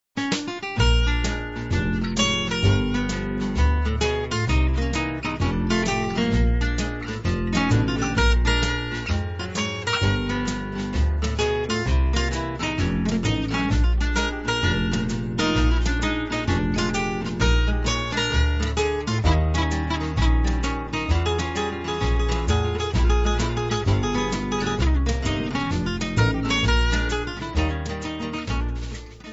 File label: backporch blues